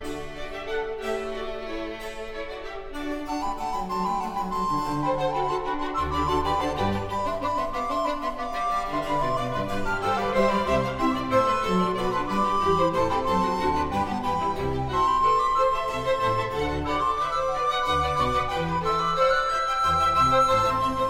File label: brandenburgisches konzert nr 4 g dur 1049 allegro